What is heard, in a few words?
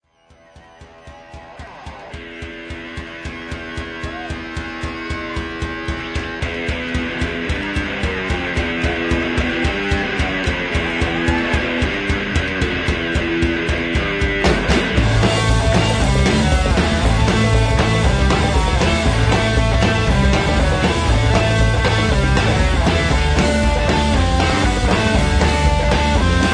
Blues Theme